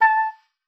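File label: A5, oboe d'amore; Xsample VOL-7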